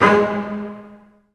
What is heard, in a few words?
Bss Spc G#2; Digital Sound Factory Virtuoso